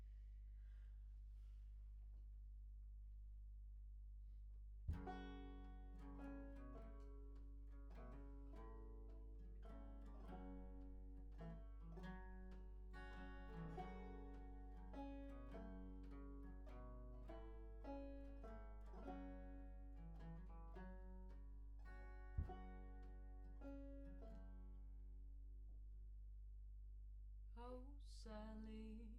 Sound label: Voc Omni